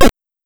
hit; Mega Man; gamesoundopedia

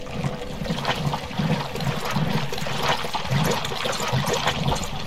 footsteps mud; Dark Souls III

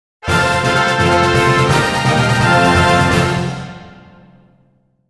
congratulations your pokemon evolved; pokemon x y

